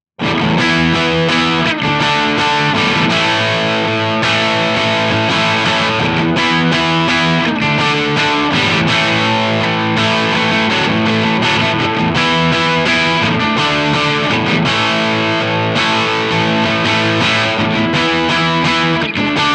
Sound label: crunch; jensentone